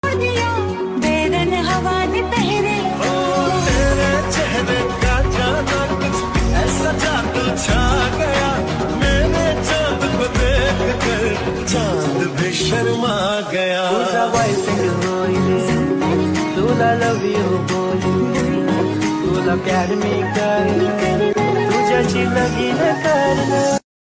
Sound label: remixe song marathi; couple goals